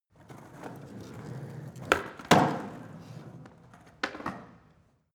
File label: skateboard indoors jump; sport freizeit